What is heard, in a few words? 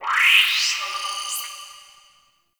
Class Lesson Slide; Warframe; The New War; Zariman Class Quiz